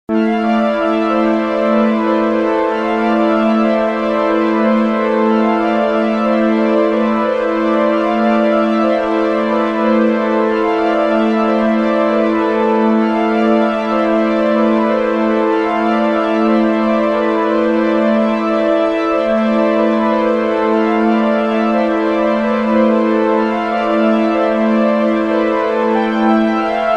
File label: melody